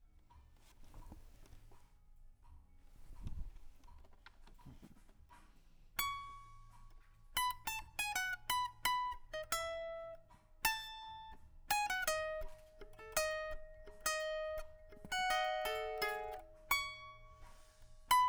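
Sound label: guitar Mic; GTR 1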